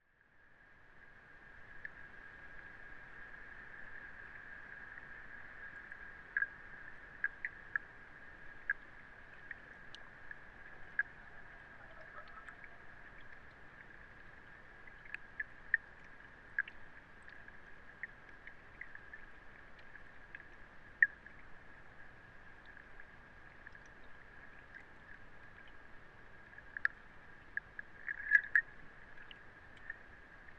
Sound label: duckweed pond calm